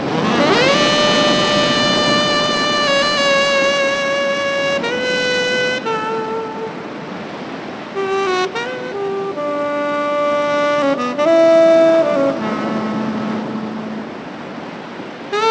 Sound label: Jazzz